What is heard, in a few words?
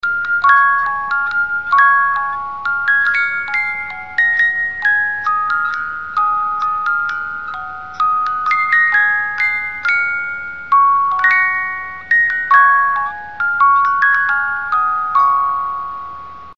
music box